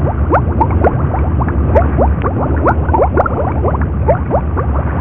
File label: lava; quakerepo fortress misc